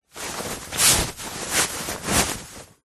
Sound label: foley clothing shirt turn inside out; zapsplat